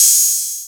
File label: 808 OPEN hi-hat; E-MU Formula 4000 Series Vol. 1; Hip Hop Nation